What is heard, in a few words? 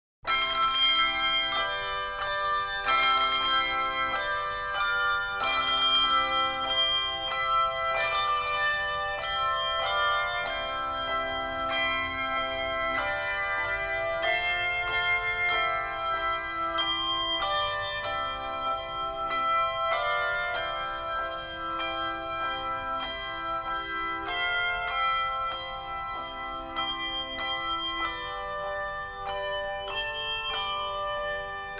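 Handbells, Handbell Music; Publisher: Jubilate Music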